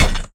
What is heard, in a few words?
footstep; Smite; god Kuzenbo